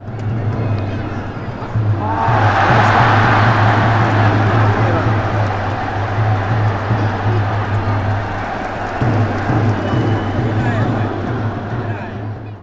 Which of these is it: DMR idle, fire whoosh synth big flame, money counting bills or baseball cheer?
baseball cheer